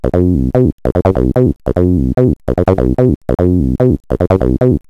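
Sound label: slide; x0xb0x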